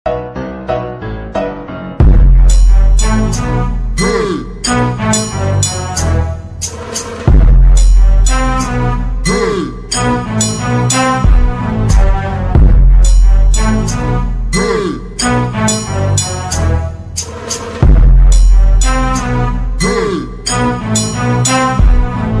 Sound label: phonk slowed